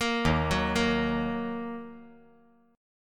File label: D sharp Minor, chord